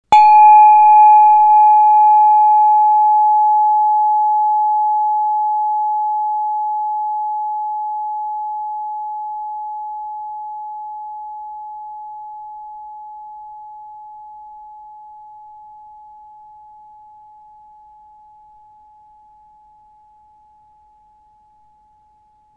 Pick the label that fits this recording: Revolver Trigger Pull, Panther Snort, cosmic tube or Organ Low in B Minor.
cosmic tube